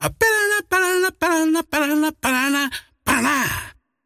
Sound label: singing; dota; largo